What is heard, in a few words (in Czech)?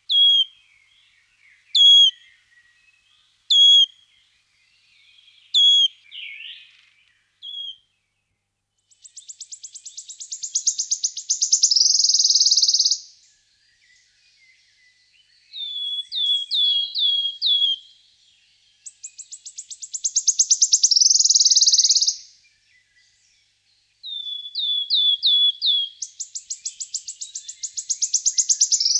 Budnicek lesni Phylloscopus sibilatrix